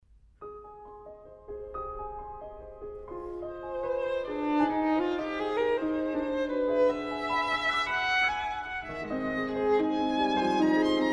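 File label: violin sonata; antoine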